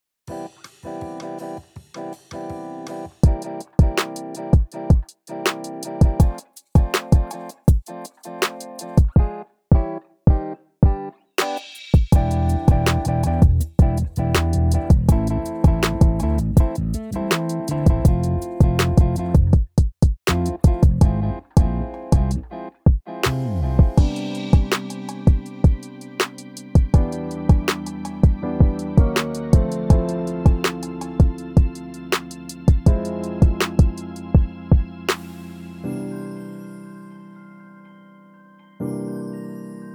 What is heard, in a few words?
Instrumental; Rhythm Doctor OST